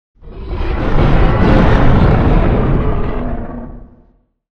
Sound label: monster